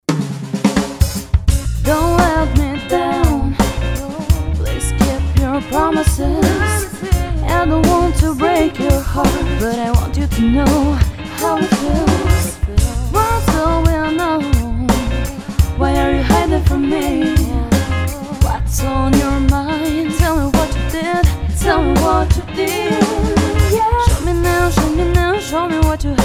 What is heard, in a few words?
Vocal Hooks